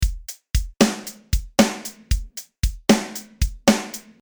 Off Snare

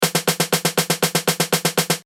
Left Right Snare

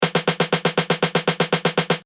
Left Right Snare

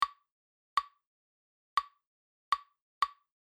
Rumba Slow

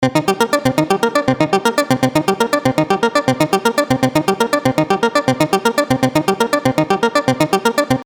Recorded Arp

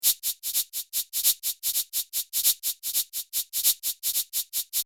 68 shaker fast

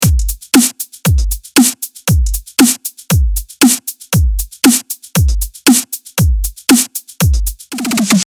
Glitch Hop

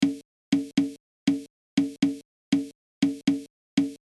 Bongo slow

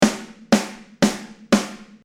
Flam No flam

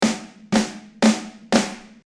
Flam with Flam

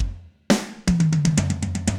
Tom Roll

